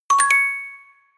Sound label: correct